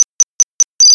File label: Blip